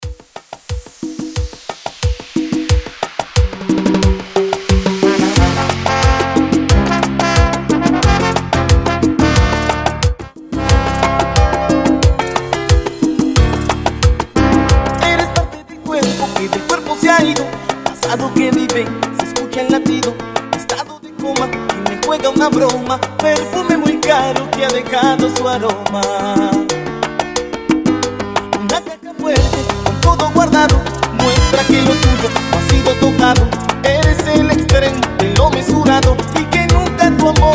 intro outro 90 bpm

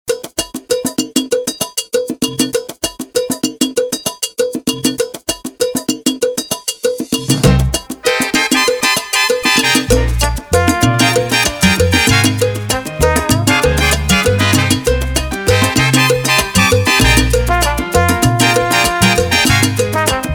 SALSA RMX

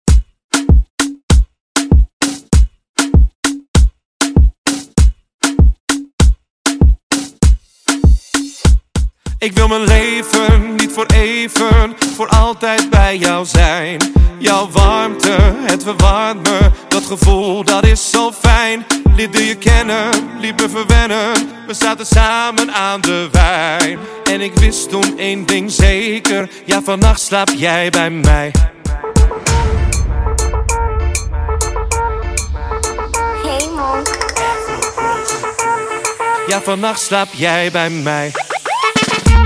remix bpm 98

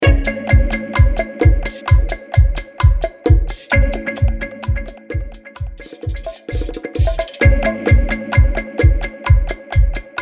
Intro Outro Break 130 BPM; Prodeejay Remix

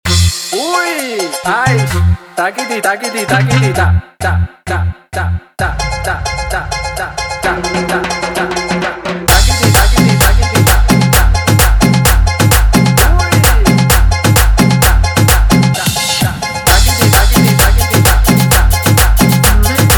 guaracha remix 130 bpm; Reggaeton dj remixes; Prodeejay Remix

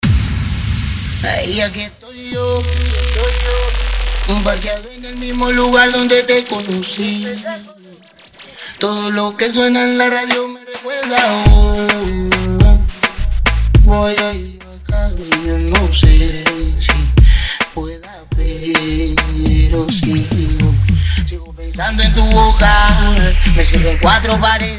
Open Show 105 BPM

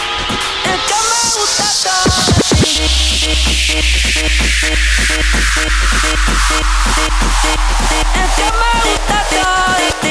remix

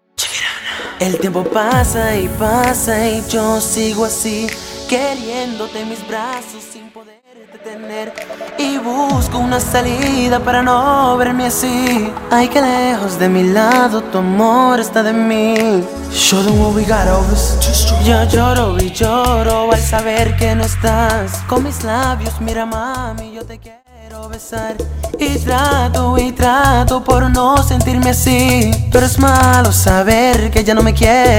Open Show 130 bpm; Prodeejay Remix

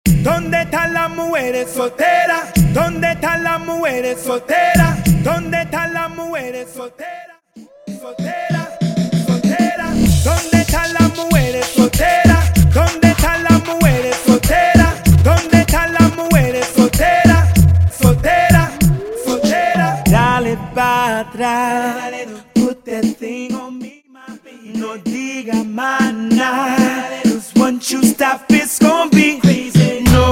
Acapella Starter 96BPM

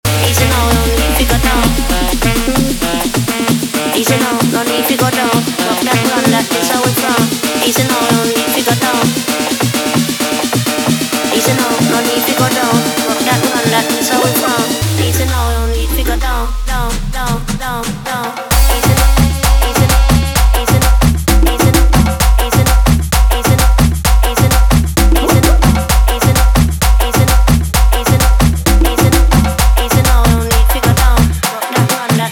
tribal remix 130 bpm

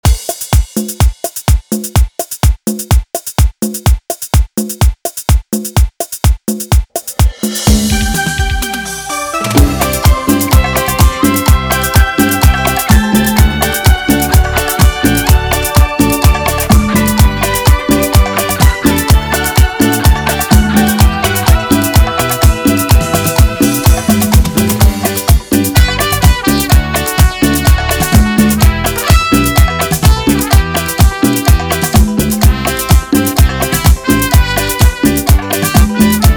126 bpm

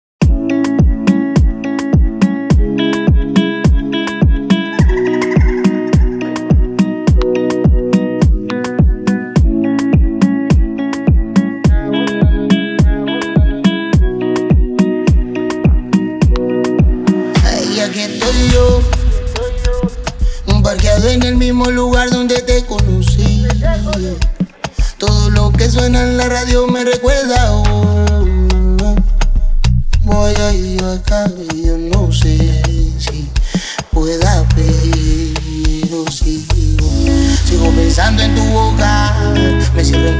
Intro Outro 105 BPM

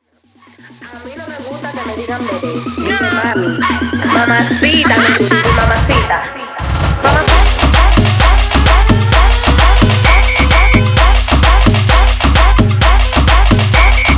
mamazota guaracha remix 130 bpm

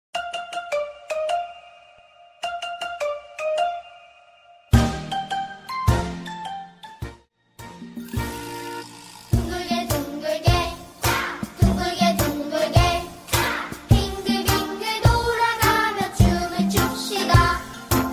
Open Show 90 BPM

